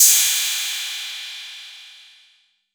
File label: PERC 808